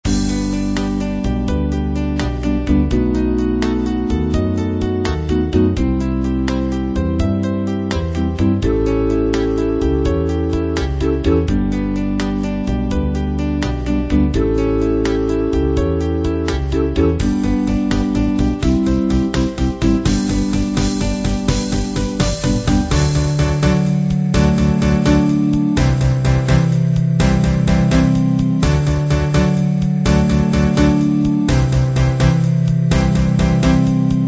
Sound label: slow rock